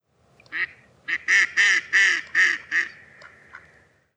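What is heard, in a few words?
mallard anas platyrhynchos; kryakva; utok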